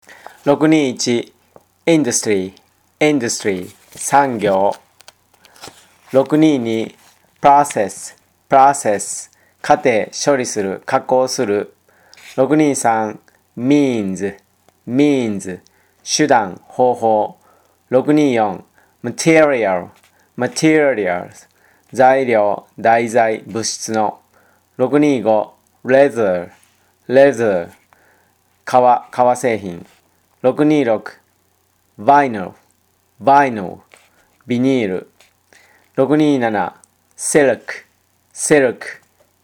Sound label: tango